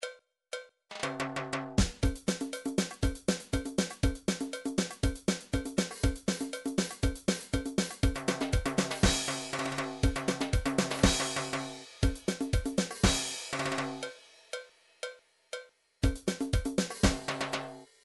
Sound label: drums cha cha, midi drums